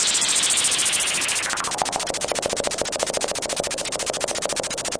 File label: noise, Noise Sweeps